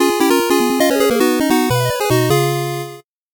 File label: 8bit jingle normal